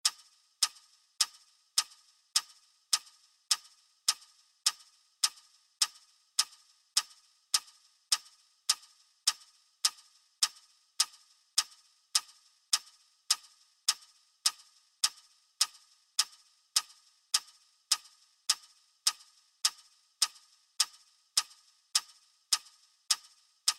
TickTick

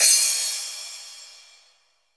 soft hit finish; osu!

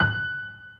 normal hit whistle; osu!

